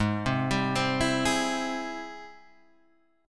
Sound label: G sharp Augmented Major 7th G sharp, guitar; G#+M7 Chord {4 3 5 5 5 4} arpeggiated